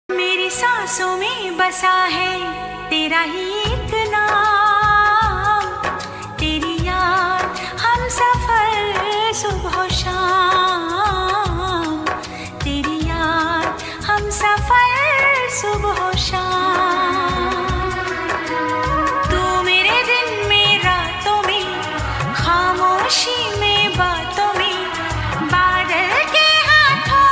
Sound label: Female Version Ringtone